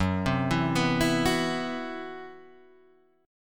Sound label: C Major 7th Flat 5th F sharp